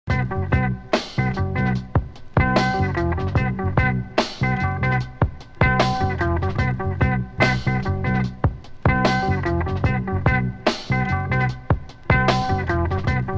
beat break

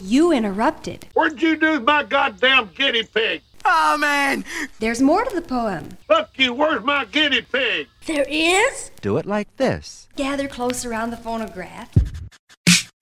cuts beats breaks samples stuff; threepeeoh

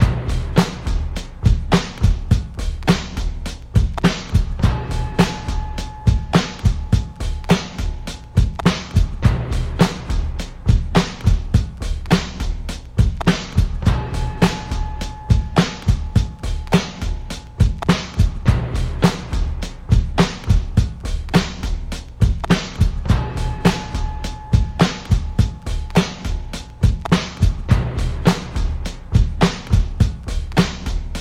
cuts beats breaks samples stuff; threepeeoh

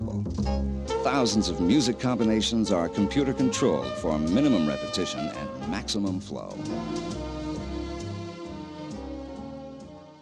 jazzercise

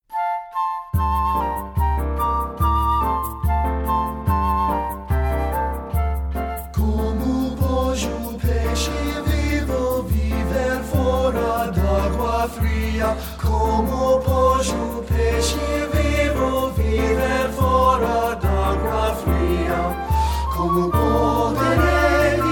Two Brazilian Folk Songs

